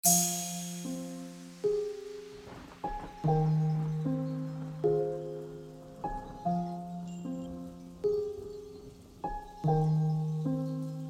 Piano

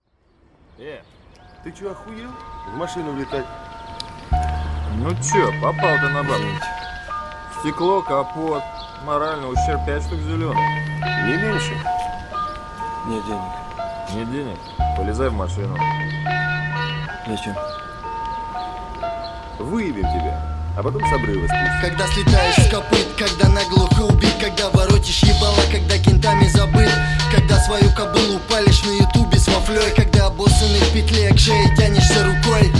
Hip-Hop

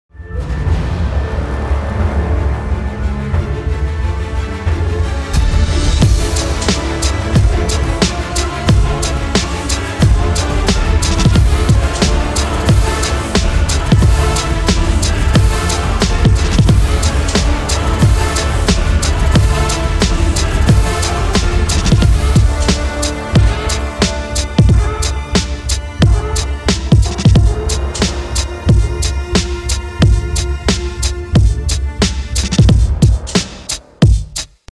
Hip-hop Mix; WOT BLITZ

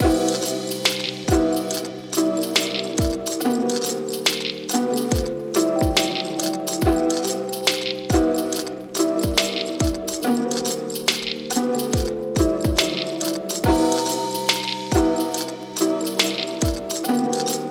Lofi Hip Hop Chillhop Music

